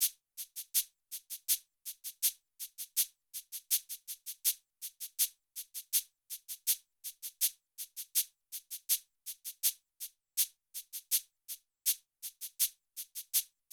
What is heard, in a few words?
shaker, Drum Tracks, 081 Cmin